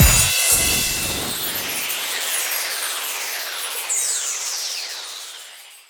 wheel; Amatic; Fruit Box

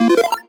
win; Amatic; Lucky Little Devil

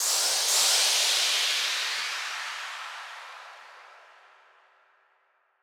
count up noise; Amatic; Book of Fruits

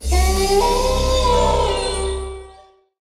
free spin init; Amatic; Wolf Moon

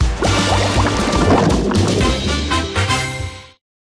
free spin init; Amatic; Wild Shark

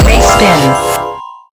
respin info; Amatic; Wild Respin

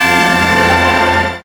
win; Amatic; Book of Fortune

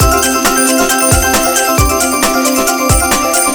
count up; Amatic; Hot Diamonds

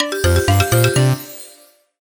win; Amatic; Chinese Spider

